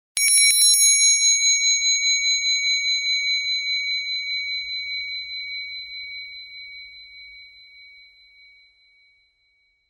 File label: bell